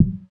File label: Wrap Boundary Alternate; iOS 26 Sounds